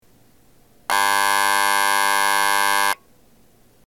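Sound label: Mishima Futsukamachi departure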